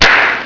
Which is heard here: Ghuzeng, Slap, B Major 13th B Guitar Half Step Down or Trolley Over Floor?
Slap